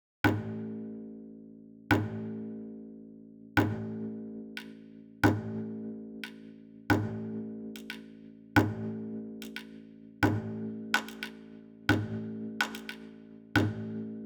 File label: pizz Bartok binaural